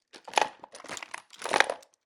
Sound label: Shaking Small Wooden Box; Sonic Bat